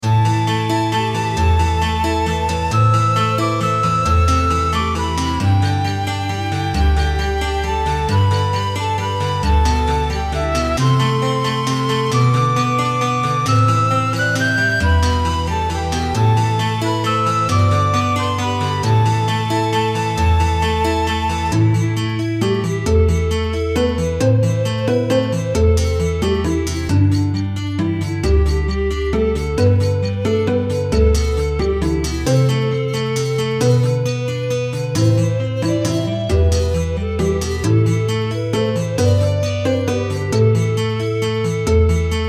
mist covered mountains eq less bass; bo jingles

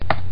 Simple click sound effect; lever pulling

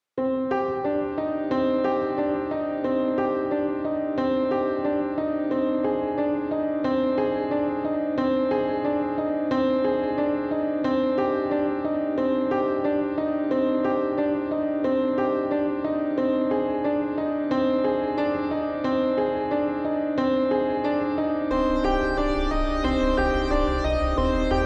80s horror intro

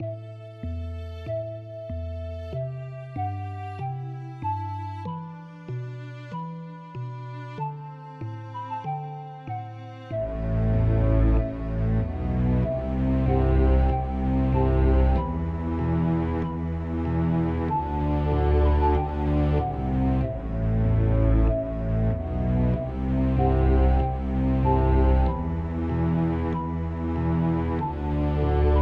Through Fire, Violin, Flute, Loop; Through Sea

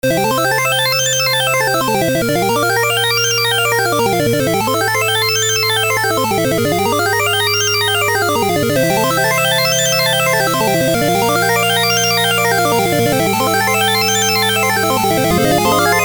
8 bit

